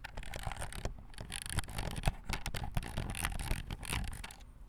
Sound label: Plastic Fidgeting; BB Retail Therapy Sample Pack